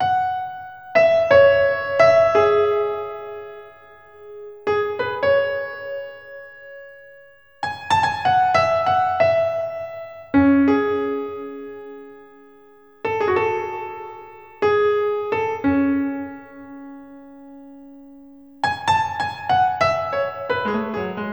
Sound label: Piano Solo, Electro Lounge; Sonic Foundry (Sony Creative Software); 090 Below Bangkok